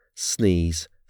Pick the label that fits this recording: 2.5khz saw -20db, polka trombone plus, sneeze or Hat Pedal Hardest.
sneeze